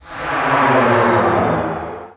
NOISE, Production Effects

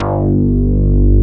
Roland Synth Bass, Analog Bass; Roland - Rhythm Section

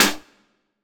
SD HIP; Best Service; Dance Mega Drums

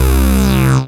SYN Filtron; Best Service Gigapack 1

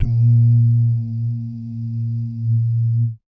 BASS DUMMS, Vocal Planet Jazz FX; Spectrasonics

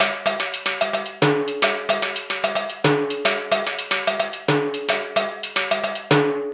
Timbale and Bell; Drums

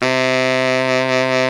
baritone C2, BARITONE SAX; InVision Interactive; Lightware VOL-1; Instruments & Percussions

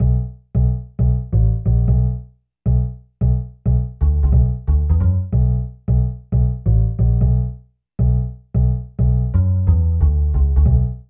BASS LP; Phat & Phunky; East West

